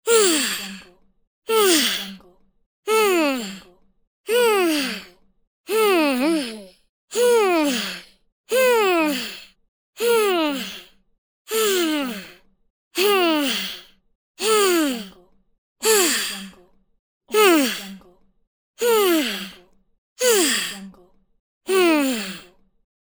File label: cartoon character concerned sigh pack